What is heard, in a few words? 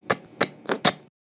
Kitty Cat Licking; creatures organic; Backpack